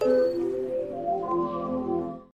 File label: Synth Start Up; Humans